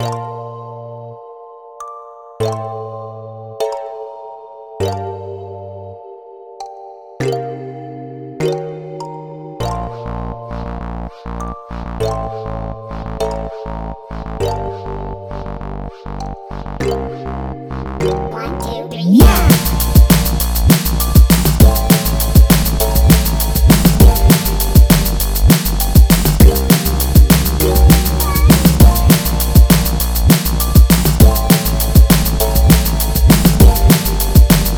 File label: Carol Roll (Instrumental)